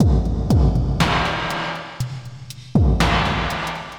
Drama Drums (120BPM); musicradar

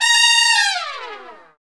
BRS, TRUMPETS; Roland LA; Roland - LA Composer vol. 1

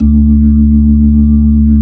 B-3, Jazz

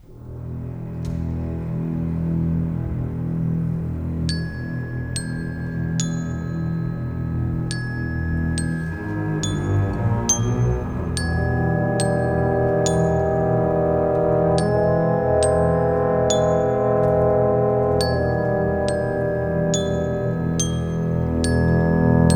Cinematic, Orchestral Series; Sonic Foundry (Sony Creative Software); Orchestral Series VOL-3 - Cinematic

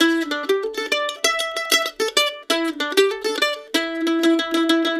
LICK, Blues Guitar

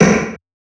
Snares, Alesis Performance Pad

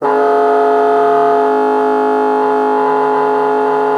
MULTI, bassoon; Xsample VOL-6

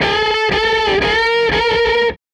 Les Guitar Loop

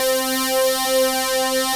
FATTY SYN, synths; Pro-Rec - Synthsonic

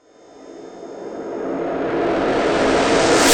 Fade into sect, Yamaha PSR E305

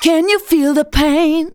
vox, Techno Trance; Ueberschall

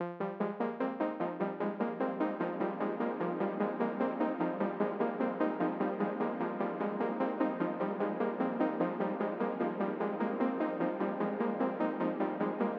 ARP BED F

